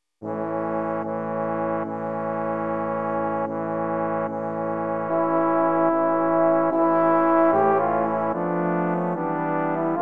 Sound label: Romanian Folk Dance